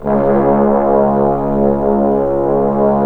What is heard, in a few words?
BRS BSTBN, Bs.Bone Sect; Roland L-CDX-03 Disk 2; Bs.Trombones